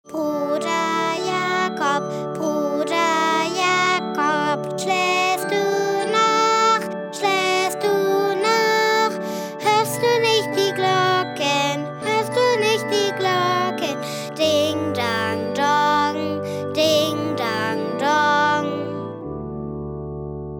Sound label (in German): Gesang; Bruder Jakob